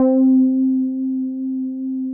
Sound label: cs synth lead, yamaha reface